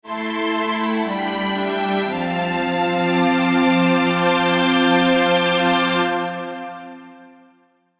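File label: Short RPG, BGM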